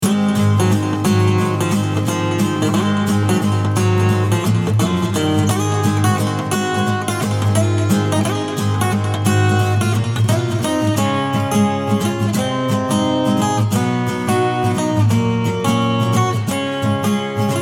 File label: Acoustic